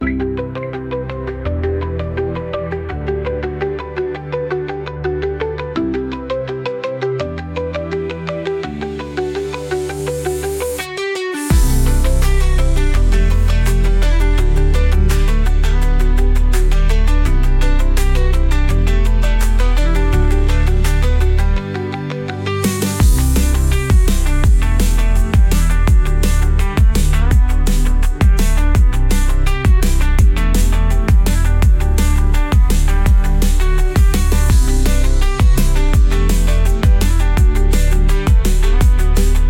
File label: Pop